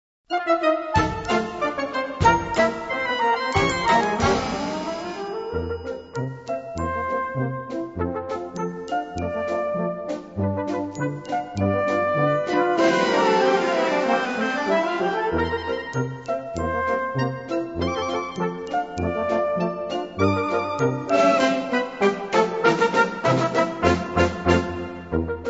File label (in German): polka